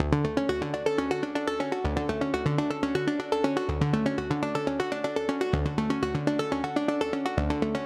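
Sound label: 122 BPM Arps A major F minor Tonic D; arp essentials; Cycles of Motion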